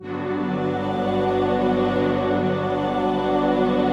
122 BPM Pads Dmaj Bmin Tonic G, ambient synth pads